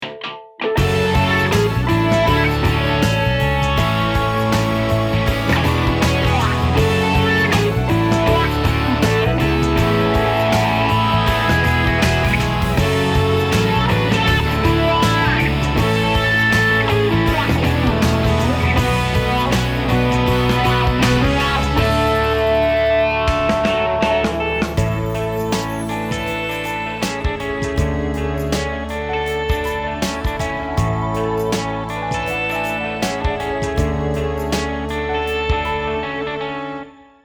Joyful Skyline, ringtones; LG Wing 5G Verizon

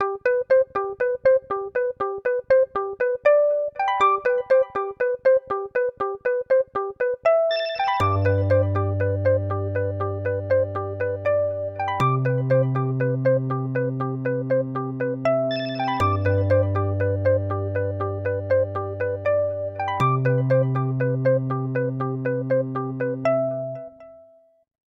Take Off, ringtones; LG; Wine Smart

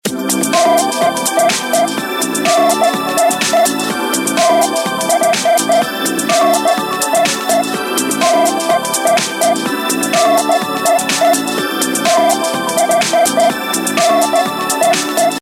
Cycle Beep, Ringtones; LG SU630 Prototype

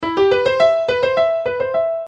sms; Huawei C5610

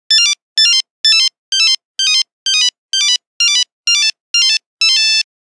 Hopping down, Nokia Buzzer; Windows Phone apps

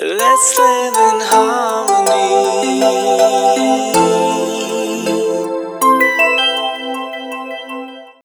Harmony; PlusFourSix

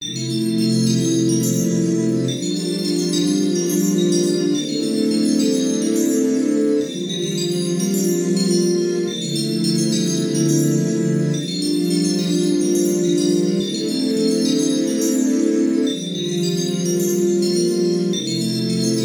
Surf Chimes; HMD Barbie Phone US

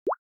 Water Drop Notification Night

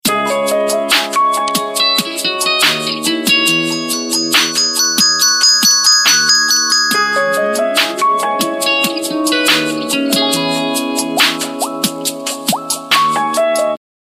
Ringtone, Samsung GT-B3313 Corby Mate; India

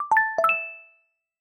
notification decorative; Alerts and Notifications; Google; Material Design sounds

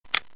Video Record, ui; Crius; Q7A+

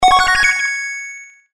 Level up Notification, 8-Bit; HTC; Sound Sets

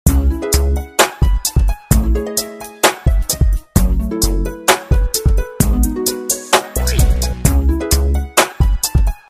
Rnb Harp; BQ Aquaris-5; ringtones

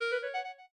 clarinet, messagebox; SKY IM-A800S-VEGA-LTE

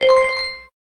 Xylophone; SKY; IM-A770K VEGA Racer

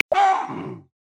Olive Baboon; Xiaomi Redmi-Note-8-Pro; Africa

